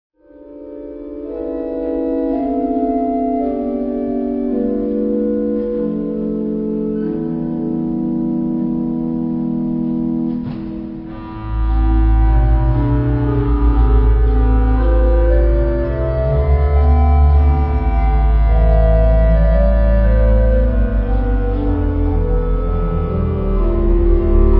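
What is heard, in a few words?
Canzona, organ; Catharina; Dupre op62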